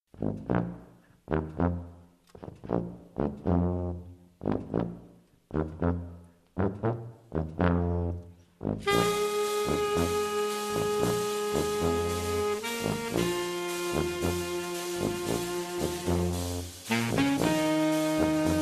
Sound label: Tuba Funk